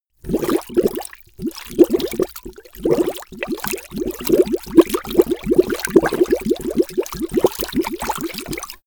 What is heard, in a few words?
bubble